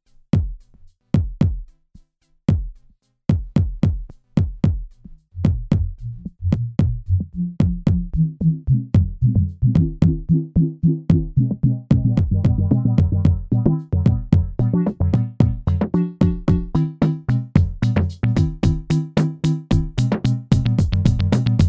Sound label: Tempest